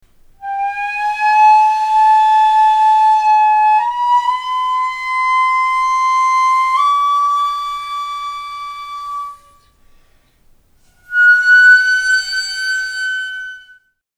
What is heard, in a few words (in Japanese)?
kagurabue